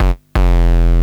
Chain Bass - 4A; Jungle; Blu Mar Ten; Jungle - 1989 to 1999

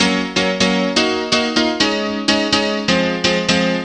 Piano; Blu Mar Ten; Jungle Jungle - 1989 to 1999 Samplepack

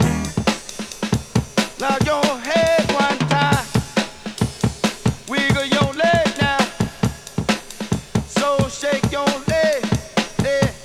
Breakbeats

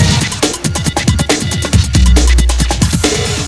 Take You Break; Jungle; Breaks; Blu Mar Ten - Jungle Jungle - 1989 to 1999 Samplepack

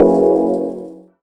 Grey Chord; Jungle; Blu Mar Ten - Jungle Jungle - 1989 to 1999 Samplepack

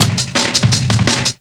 Reckon Break; Jungle; Breaks; Blu Mar Ten - Jungle Jungle - 1989 to 1999 Samplepack

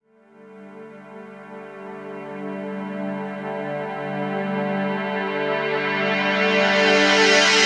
Night Train Pad - 9B; Jungle; Pads; Blu Mar Ten; Jungle - 1989 to 1999

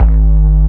Town 808 Bass - 1A; Jungle; Blu Mar Ten; Jungle - 1989 to 1999

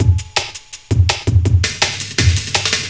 Check Break, 11A, Jungle Breaks; Blu Mar Ten - Jungle Jungle - 1989 to 1999 Samplepack

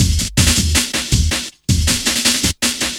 Way Break; Jungle; Breaks; Blu Mar Ten - Jungle Jungle - 1989 to 1999 Samplepack

